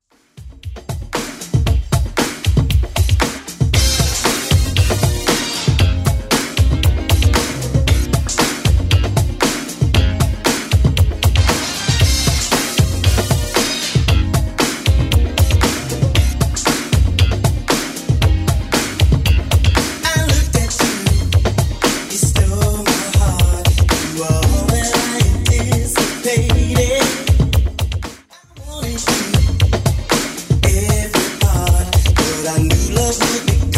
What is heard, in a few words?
80s Funk Edit 116bpm